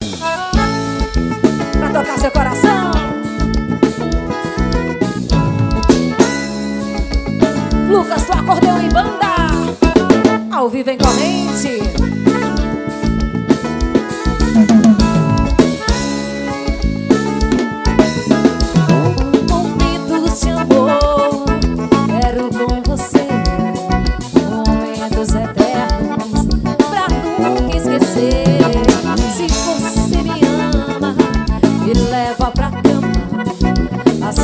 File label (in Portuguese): acordeon